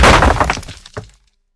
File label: crushing debris collapse